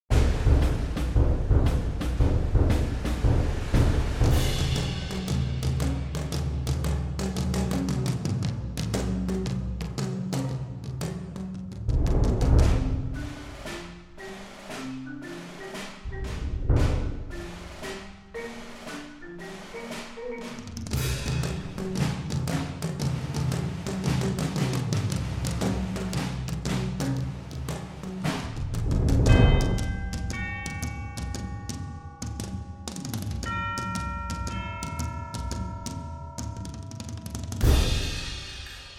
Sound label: Perc Cue